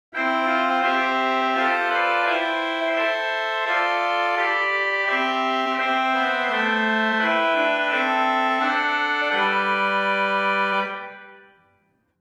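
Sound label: Great Clarion 4, organ stops; Hauptwerk; St Annes Moseley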